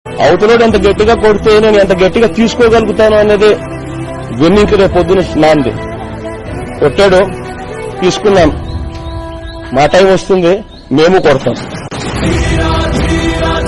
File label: Emotional Ringtone; Ysrcp Jagan